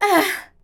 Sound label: Grunt; Lewd SFX